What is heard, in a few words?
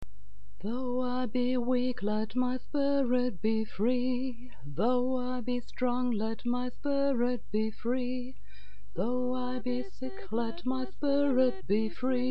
vocals